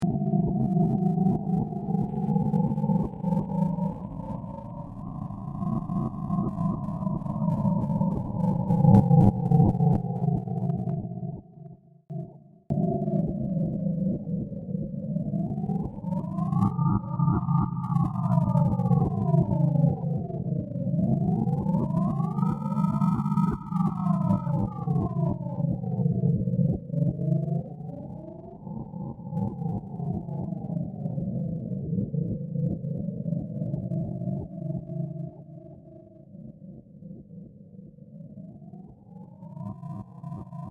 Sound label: The Wind Cries Zombie, scary soundscape; horror